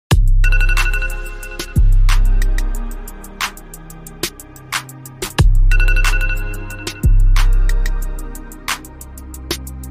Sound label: iphone alarm remix